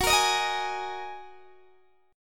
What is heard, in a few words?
Gb 6th Add 9th Gb down Guitar DADGAD